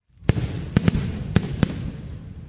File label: event firecracker